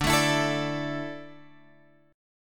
D Suspended 2nd D down Guitar Open F, chord; strummed

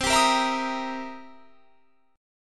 C 7th Sharp 9th C; strummed; JGuitar

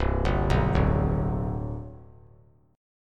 C Major 7th Suspended 2nd C down, chord; CM7sus2 arpeggiated; JGuitar

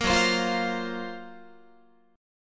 F sharp Major 7th Flat 5th, down, Guitar; F#M7b5 Chord; strummed; 13,14,15,15,16,16

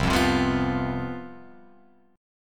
C Major 7th Suspended 4th C down Guitar Open F; strummed

